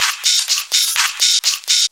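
hihat loop 125 bpm, Percussion Loops, Hihat & Filter; Houseworx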